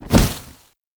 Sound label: Bird Of Prey Wing Flap Down; Characters; Warframe